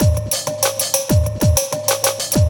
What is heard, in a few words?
Jump Keys, Beats; Sonic Foundry (Sony Creative Software); Synchro Funk